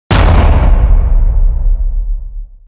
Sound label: Hit Example